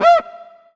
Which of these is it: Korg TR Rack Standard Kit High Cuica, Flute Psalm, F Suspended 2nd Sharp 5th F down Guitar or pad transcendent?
Korg TR Rack Standard Kit High Cuica